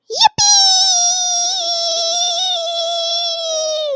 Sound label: yipeeeeeeeeeeeeeeeeeeeeeee